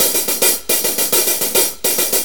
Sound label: 4-4 HiHat Loop; 4-4 Drum Loops; HiHat Ride Loops; Sonic Foundry; Drum Tools